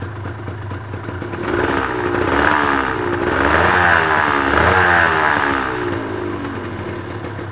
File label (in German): klang, Suzuki RGV250; original Sound (V-2-Zylinder-2-Takt)